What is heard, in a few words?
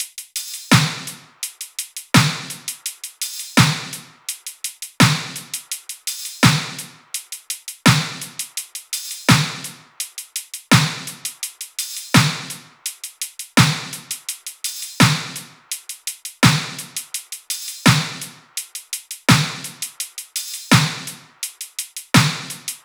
top, Drum Loops, Top Loops, 84; SD MIDNIGHT Sample Pack; Slate